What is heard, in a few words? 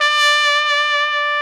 SOLI TRP, Alpine Volksmusik TROMPETEN; Best Service